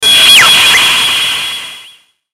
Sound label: Static Synth Stab Warp, Static Soft Chopper Swell; Production Element Imaging Element Accent Transition; Blastwave; Static Sound Effects